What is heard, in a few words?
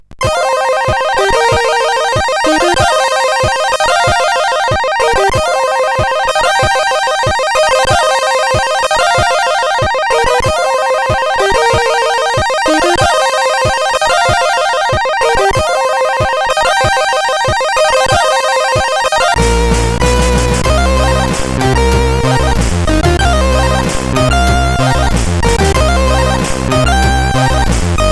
test tune, sid CSG8580R5